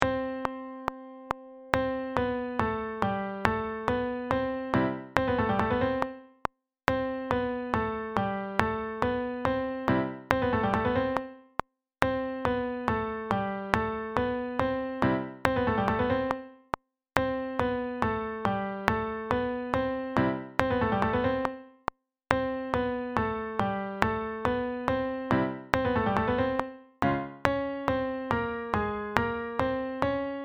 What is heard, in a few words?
pitch training up